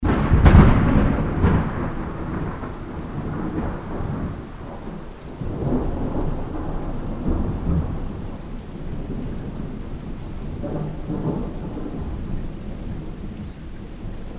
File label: thunder striking